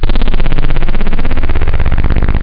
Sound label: oohh